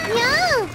noise

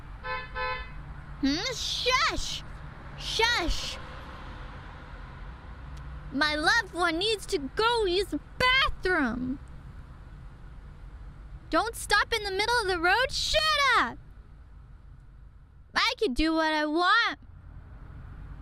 getting honked

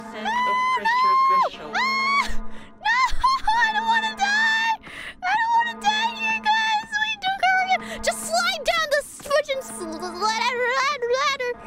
panic noises; IRyScream

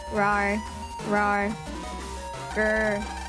"RAWR"; noises

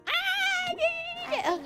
"aaaah ninini"; noises

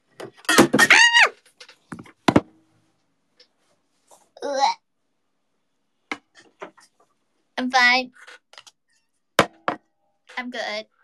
dropping something

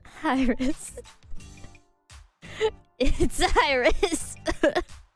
laughing

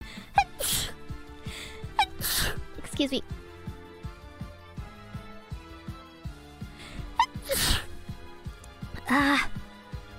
Sneeze

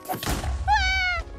creeper exploding

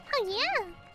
hope noises

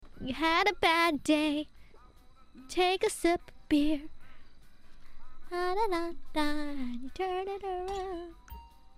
Singing; IRySinging

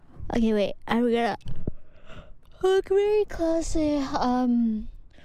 Yawn

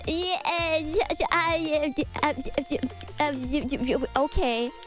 noises

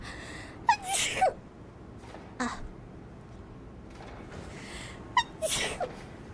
Sneeze

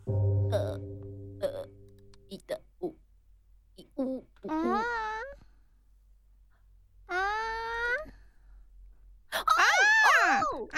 noises

